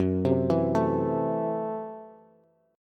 Gb Minor 7th Flat 5th, Bass; arpeggiated; JGuitar

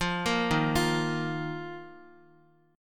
Eb Suspended 2nd Guitar, chord; Ebsus2 arpeggiated; JGuitar

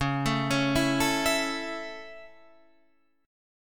C sharp Minor Sharp 5th

